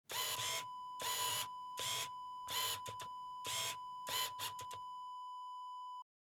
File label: camera focus motor